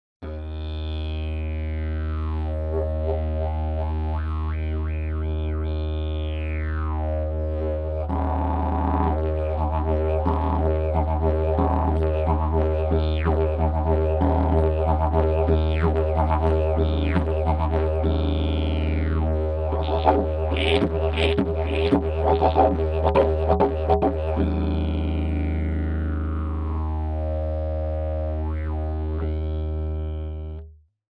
jasan hnedy, didgeridoo

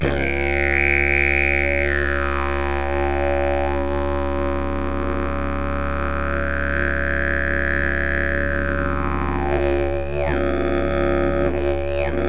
briza zimni, didgeridoo drevene didgeridoo

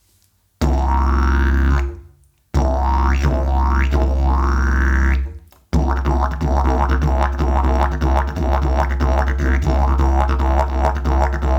javor, didgeridoo; javor klokan

